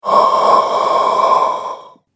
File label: Classic Zombie Breath; horror; Zomby